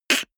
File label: beatbox snare harsh damped